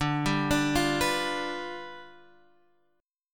G 7th D

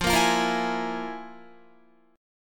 F Minor Major 7th Double Flat 5th, Guitar; FmM7bb5; strummed; 13,13,15,15,16,15

